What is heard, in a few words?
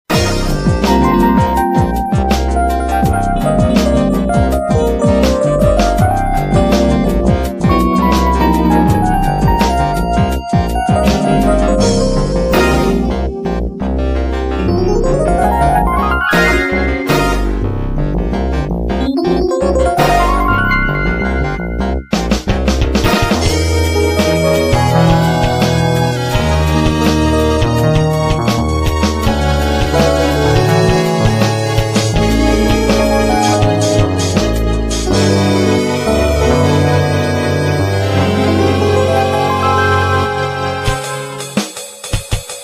Ascending, ringtones; Music Airport demo ringtones